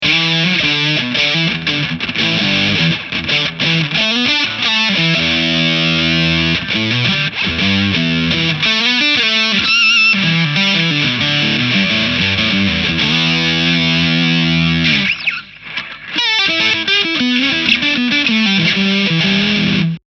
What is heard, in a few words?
full gain boost